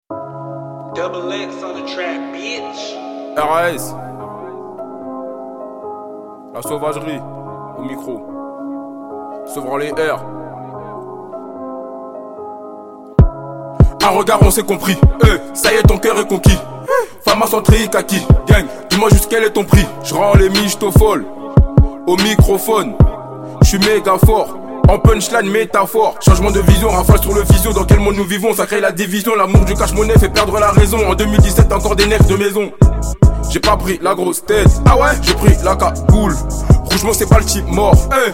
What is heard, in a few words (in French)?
Piano Sombre